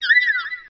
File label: cry